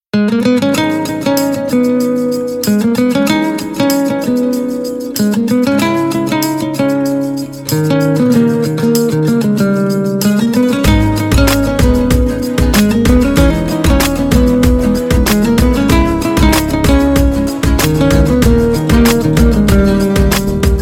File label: Nice Music Ringtone; ringtones